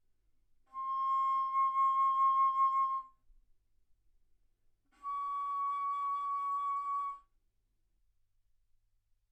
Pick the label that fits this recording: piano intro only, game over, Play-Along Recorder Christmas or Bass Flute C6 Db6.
Bass Flute C6 Db6